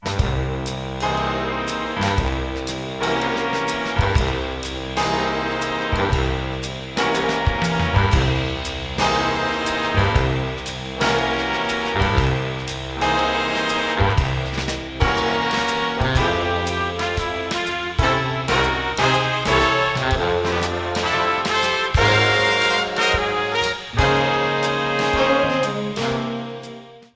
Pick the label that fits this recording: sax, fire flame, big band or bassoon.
big band